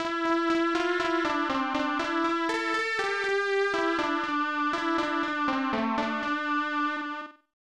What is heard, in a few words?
Golden Flute; maka maka; snes